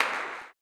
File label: CLAP, Blues Soul; Spectrasonics Vocal Planet